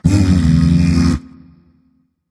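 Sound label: tongue, non-verbal; smite; voicepack; merlin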